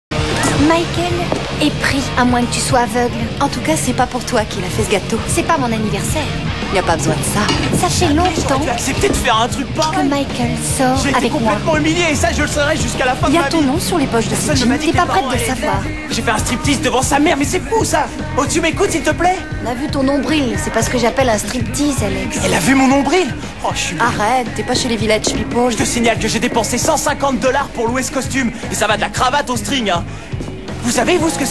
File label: string